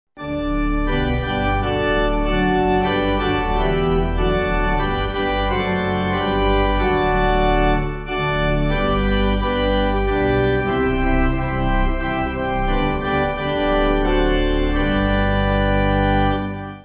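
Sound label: Pipe, hymn backing; O For A 1000 Tongues; Azmon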